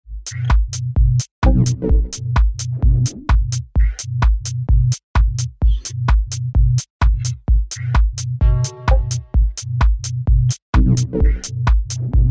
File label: grey keyboard